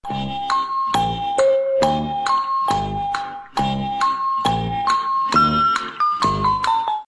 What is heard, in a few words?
waiting; LameXP